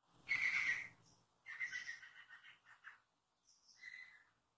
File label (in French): Chant Pic vert en vol